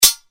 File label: sword small clang; Atlas Sounds